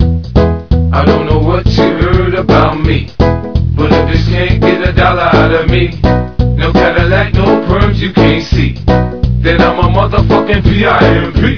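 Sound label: music door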